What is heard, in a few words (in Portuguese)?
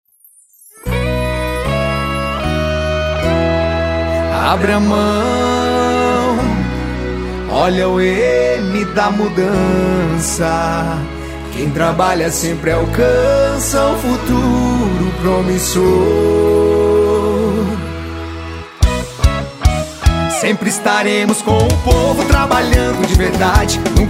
Jingle VEREADOR; Clovis